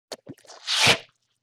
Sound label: Wet Squoosh, Vintage Cartoon